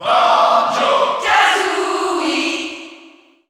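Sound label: Cheer French, crowd; SSBU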